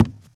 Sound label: wood, footsteps walk; boomer